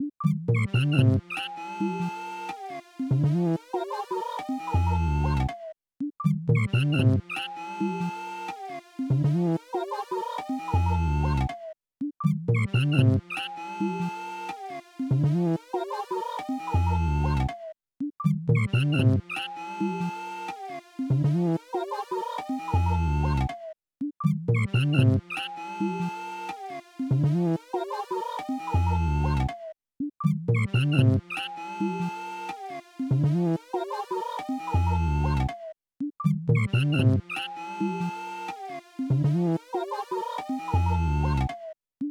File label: Flux Pads